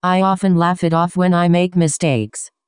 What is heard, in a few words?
laugh